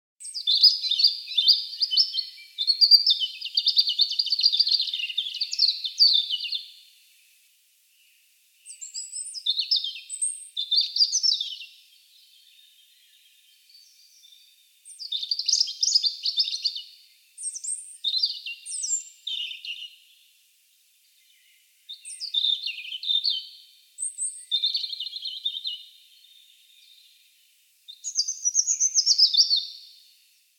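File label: zaryanka golos, European robin voice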